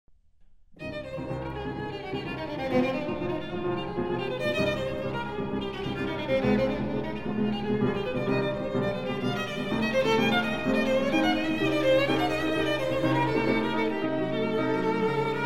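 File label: violin sonata; raff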